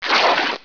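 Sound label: cloth break; Hexen2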